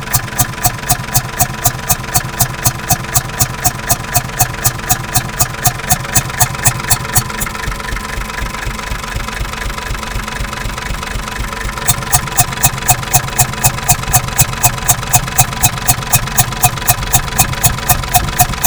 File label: Motor